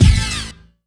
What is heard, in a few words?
F#, synth line; 90 s Sample CDs; NiceBeats; SynthLine VOL-3